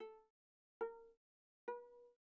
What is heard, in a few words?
Viola pizz sul A pp A4 B4